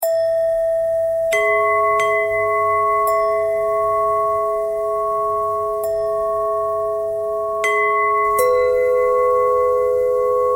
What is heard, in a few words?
bell bend threads